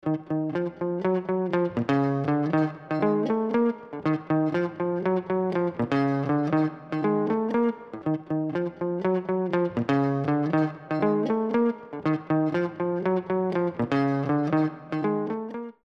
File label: Guitar Pickin Dry; SRE330